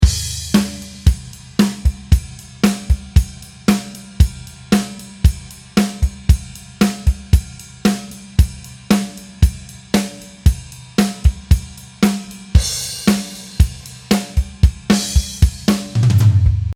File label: Rock Loop 115